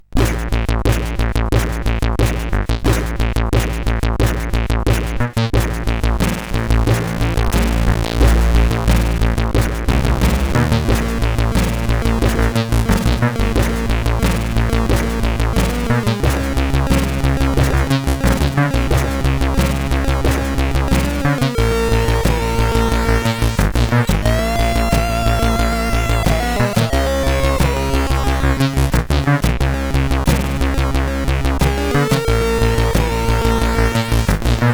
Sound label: remix, CSG8580R5; hvsc